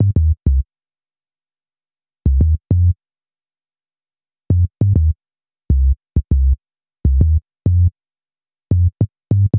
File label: Bass, Hip-Hop; Sonic Foundry (Sony Creative Software); Lukecage Underground Soundlab Hip-Hop